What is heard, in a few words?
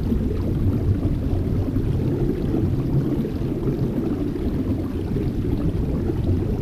Water